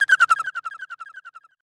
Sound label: Giggle